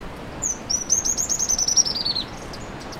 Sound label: fulvetta yellow-throated Alcippe cinerea; Yellow-throated Fulvetta Schoeniparus cinereus; Yellow-throated Fulvetta Schoeniparus cinereus is resident in southeast Tibet and western